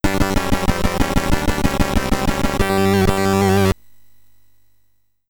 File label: Trumpet